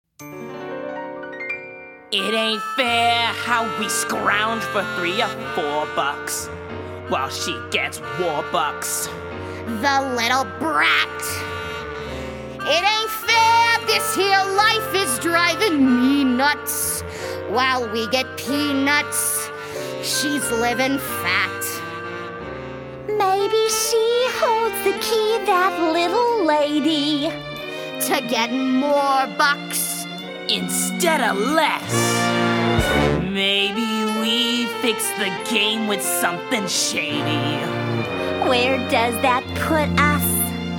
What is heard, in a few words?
Vocals